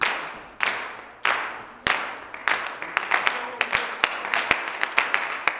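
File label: CLAPS 96, Gospel; Blues & Soul; G.CLAPS 96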